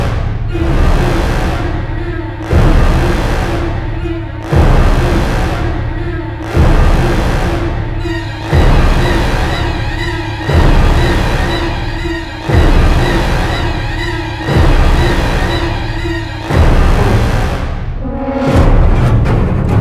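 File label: Music Tension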